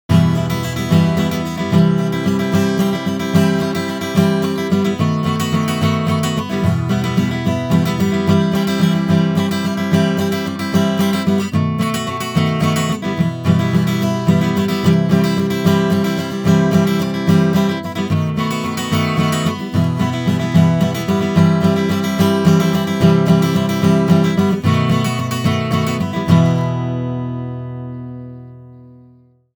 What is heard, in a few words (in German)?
Akustikgitarre 200 Hz Air